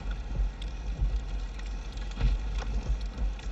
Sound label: Ambience Loop, outdoor